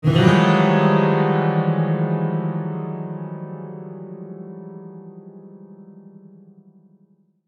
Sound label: player detected piano flail; dishonored